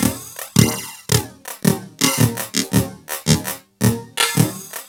LOOP, TECHNO; TECHNO-BOX; ELEC-LOOPS

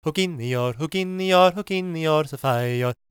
GAELIC, World Voices; ILIO; Vocal Planet

